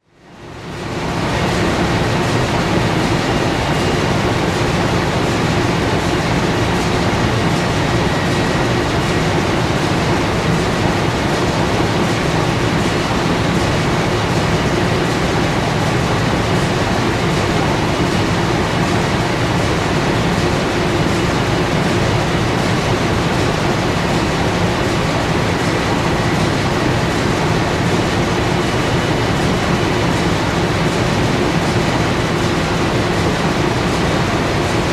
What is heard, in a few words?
SHIP CONTAINER